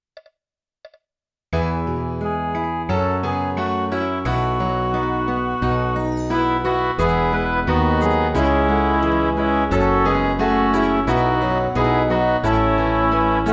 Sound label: midi